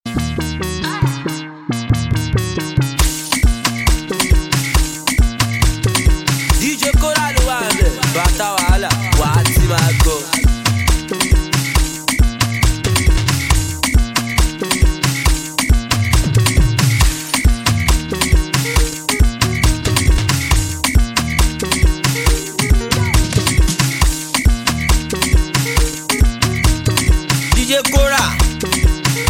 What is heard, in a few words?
Leg Work Beat